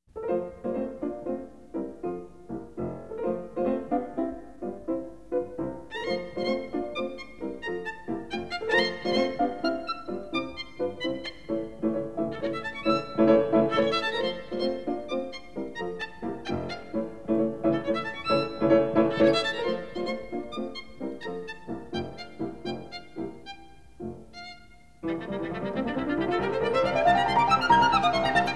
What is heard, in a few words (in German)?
Sonate fur Klavier und Violine F-dur; Nr. 5; op. 24; 3. Scherzo; Beethoven, Ludwig van; Fruhling